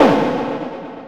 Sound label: hit, sfx; anvil